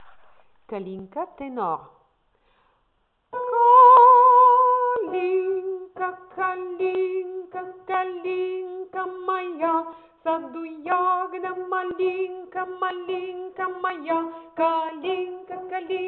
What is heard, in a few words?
tenor; kalinka